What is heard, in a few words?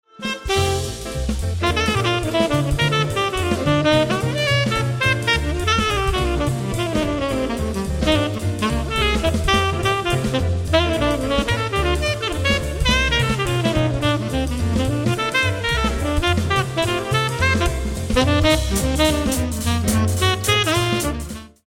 tenor